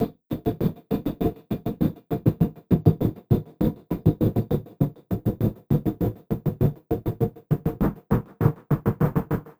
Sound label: Envelope Beat; Drums & Drones